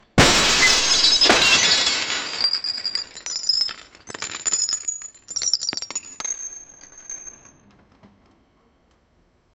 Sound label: expensive statue shatter, fragments